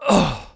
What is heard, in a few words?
death; mohaa; dialogue